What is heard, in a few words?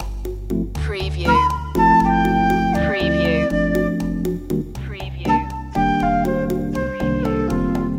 latin lope loop